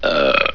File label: belch